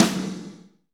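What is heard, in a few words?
snare, Power Ballad; Northstar; Drumscapes Roland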